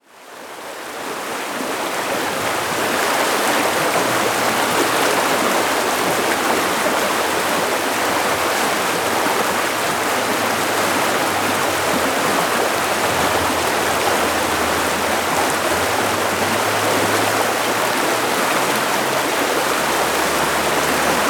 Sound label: Rushing Stream